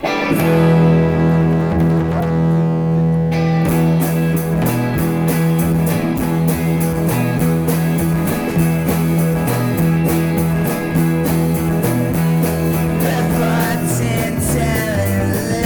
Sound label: Blues